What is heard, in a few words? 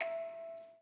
musicbox